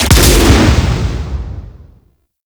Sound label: Bomb Explosion; Missions; Raid; Grn Bomb Objective